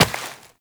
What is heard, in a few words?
Step Rock, Footsteps, Walk; Warframe; Tenno